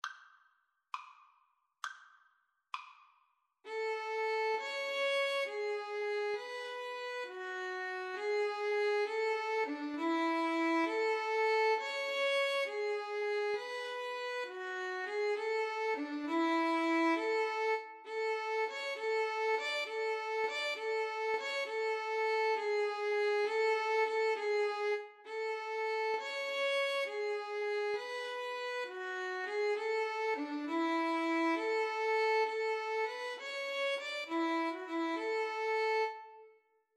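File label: sonata violins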